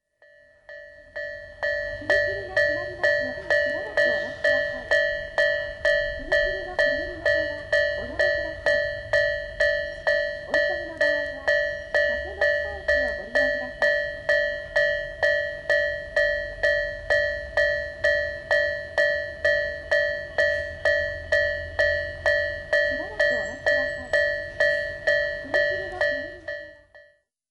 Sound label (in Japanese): FUMIKIRI; 自動化後